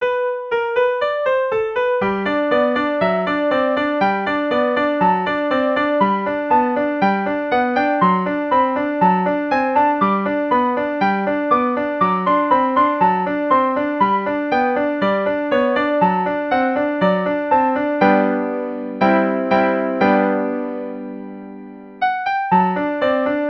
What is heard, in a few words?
small jingle